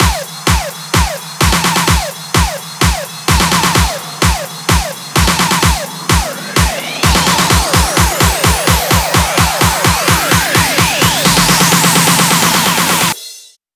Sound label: Fills 128BPM, Clubsounds